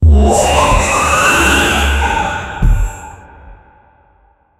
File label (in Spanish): un rey rojo lanza aun, portal